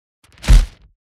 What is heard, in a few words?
SMACK